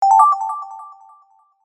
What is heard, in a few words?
ALERT